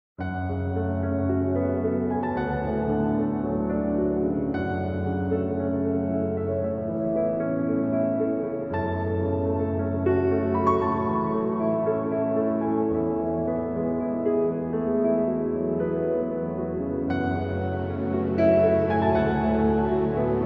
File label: Mystical Rainforest Relaxing Piano Music